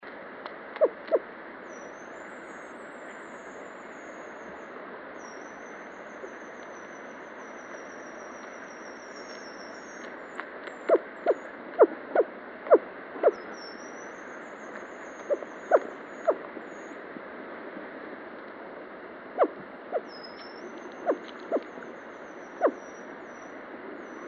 黑長尾雉雌; 帝雉; 雉科/留鳥

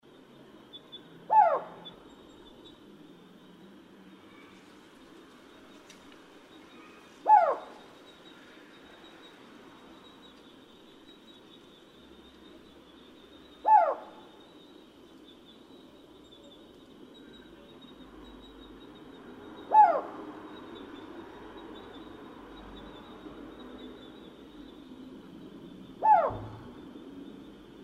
領角鴞; 鴟鴞科/留鳥

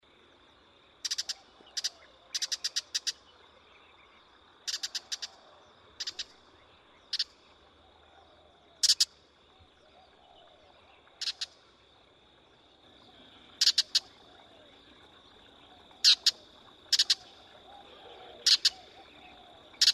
紅尾伯勞; 伯勞科/冬候鳥、過境鳥

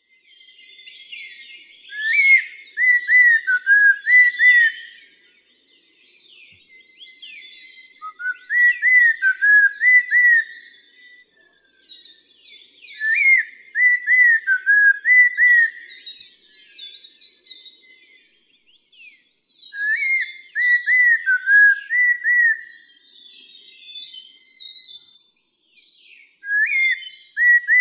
棕噪眉; 竹鳥; 噪眉科/留鳥

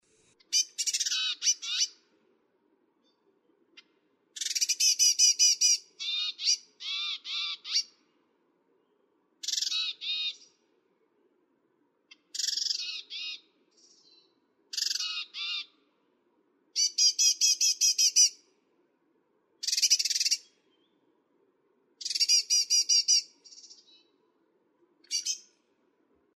綠畫眉; 綠鳳眉、白腹鳳眉; 畫眉科/留鳥

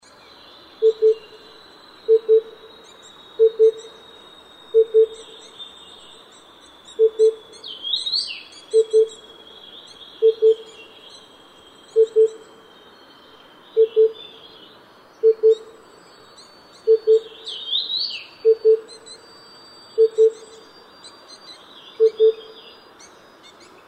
中杜鵑; 筒鳥; 杜鵑科/夏候鳥

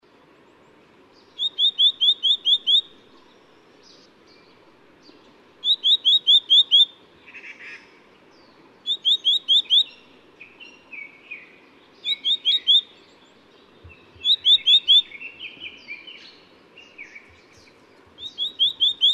黑枕藍鶲; 黑枕王鶲; 王鶲科/留鳥

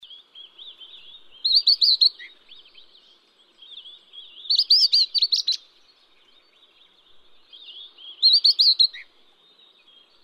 栗背林鴝; 阿里山鴝; 鶲科/留鳥

